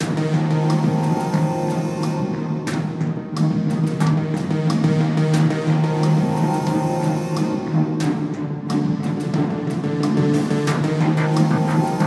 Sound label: Lava